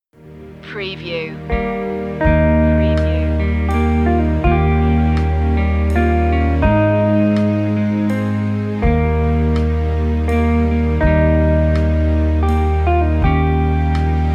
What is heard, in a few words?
amazing grace brisk verse 82bpm